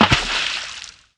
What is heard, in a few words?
water fall into